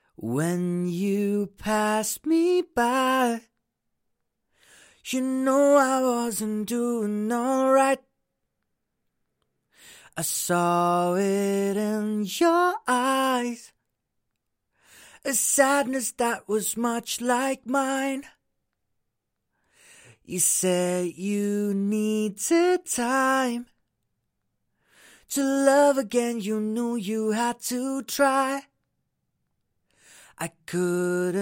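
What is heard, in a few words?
pop rnb, 104, B flat minor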